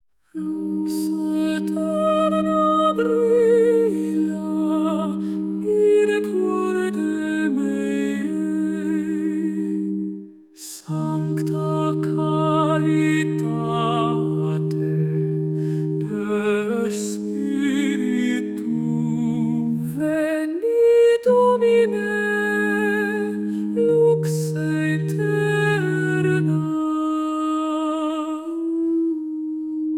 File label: Lux Aeterna Female, gregorian chants